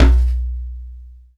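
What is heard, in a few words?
djembe bass; Akai MPC2500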